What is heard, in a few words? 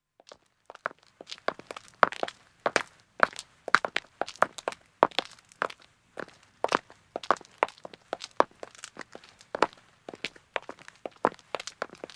Footstep Road Walk, 1 man 1 woman start and stop; BBC